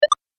Game Pause, video game

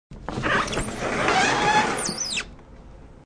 Slide Dr Opn Slw, Sliding door opens slowly.; Part of Sliding Doors (Sound Effects:Doors)

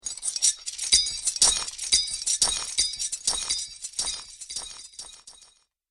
Metal Chains Magic Ambience; Chains Sound Effect; Clink, Clatter, Clang, And Clink Are The Sounds That These Chains Make.

